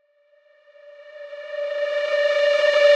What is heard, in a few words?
Tonal Reverse - D; House; FX; Sequin Tonal Reverse